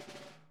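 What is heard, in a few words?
snare buzz; Sonic Reality; Interactive Drum Kits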